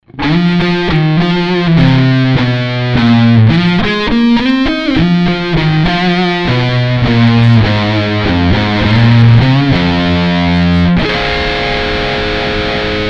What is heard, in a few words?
classic lead boost